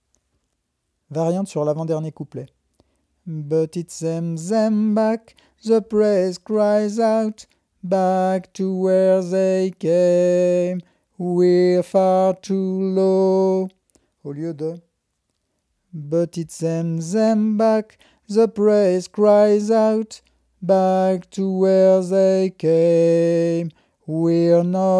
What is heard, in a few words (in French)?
Voix Variante; Song of the lower class; Version originale Ernest Charles Jones (1852)